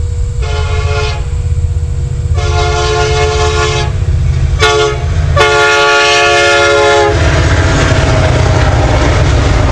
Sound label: Diesel; atsf 125